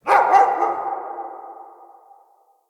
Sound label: Pack Wolf Appear Bark; Animals